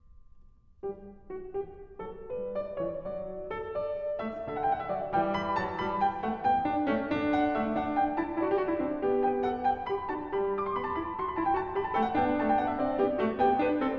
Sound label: Bach Goldberg Variations BWV988 Variation 24 - Canone All'Ottava (Allegretto Con Moto)